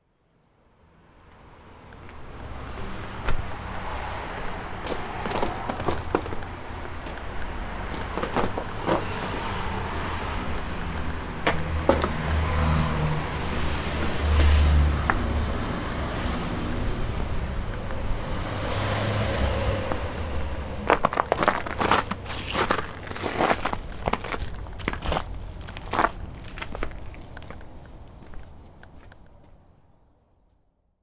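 niwa wo aruku shimobashira, walking in the garden on frost columns; 庭を歩く（霜柱）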